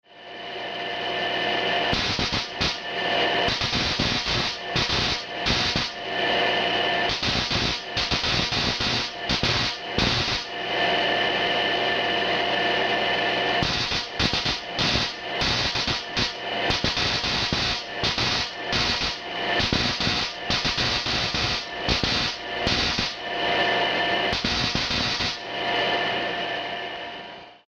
spark, sounds and personalities of cw and transmitters; CW Learning Programs